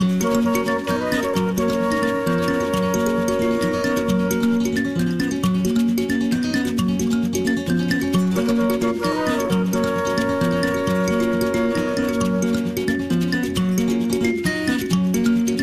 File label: Traditional (Luganda)